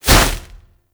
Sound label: Fist Whoosh; Warframe; Mummy